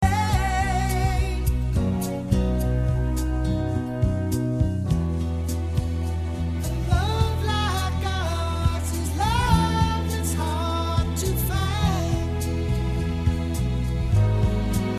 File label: Modulation